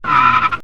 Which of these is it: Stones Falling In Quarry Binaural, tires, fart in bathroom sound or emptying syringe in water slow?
tires